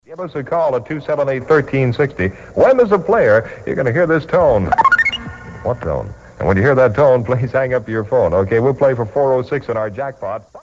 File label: tone